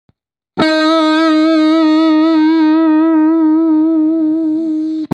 Volume Fade in Intro

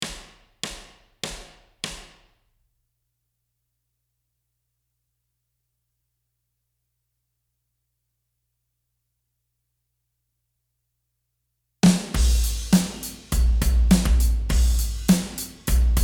Drums

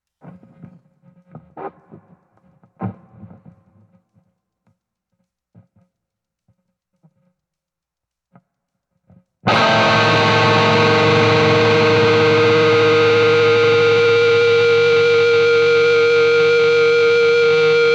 Guitar Left

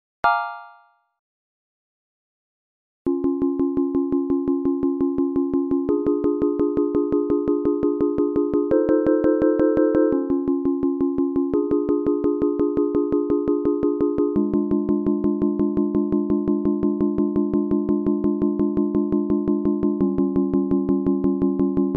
Piano; Mr. Tabs

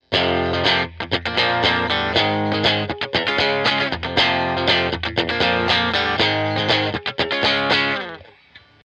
CLEAN GUITAR INTRO

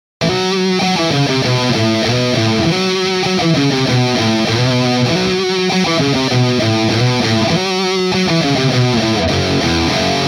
Intro Guitar Right

